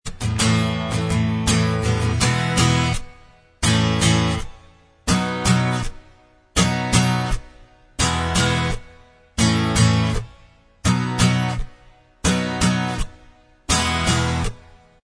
acoustic Inst Track, backing tracks

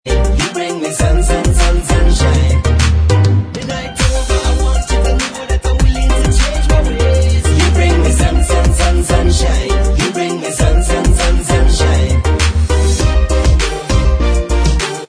Bvox

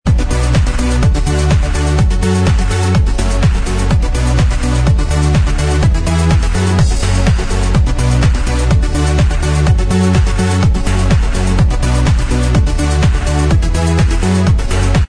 Inst

